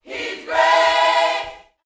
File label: Vocal Gospel PHRASE